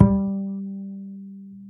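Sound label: acoustic bass soft G 3 R; Masterbits CLIMAX 7 - Bass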